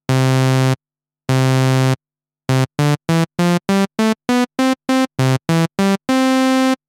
Saw, synthe

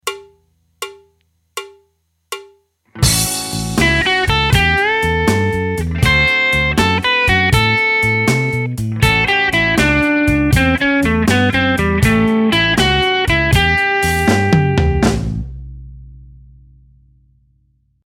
blues

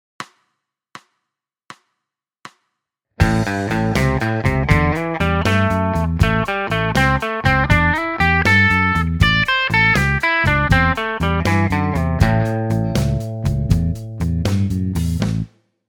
blues

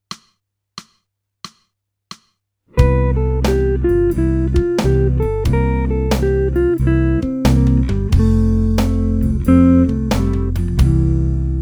jazz

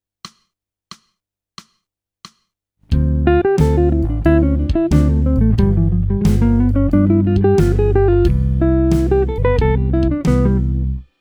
jazz

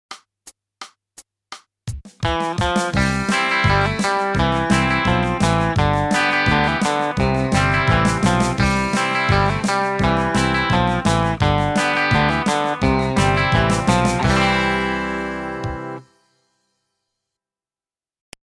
country

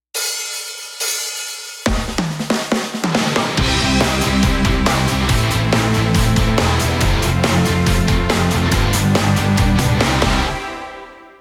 karaoke

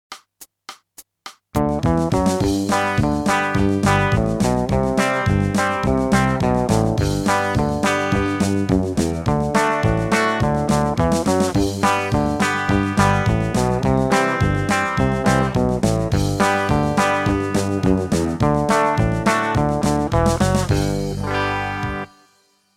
country

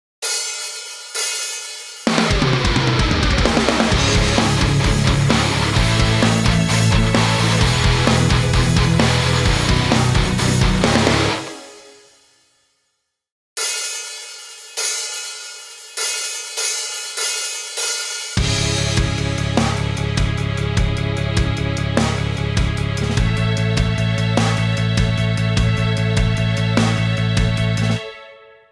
karaoke

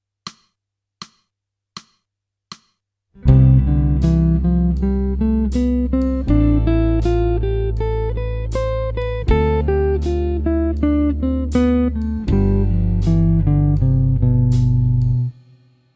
jazz

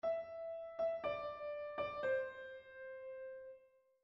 melody

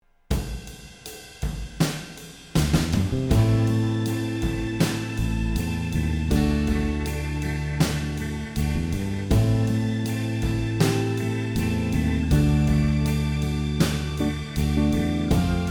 karaoke

